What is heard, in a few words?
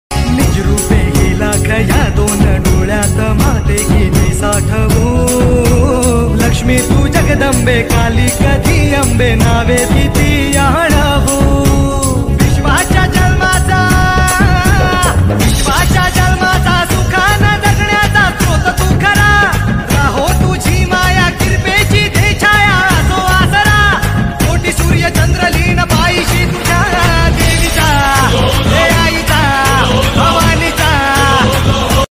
devi song ringtone marathi navratri ringtone; devachi ringtone